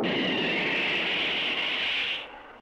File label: Roar; Gejiru